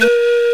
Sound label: FLTEE, FAIRLIGHT CMI IIX, WOODWIND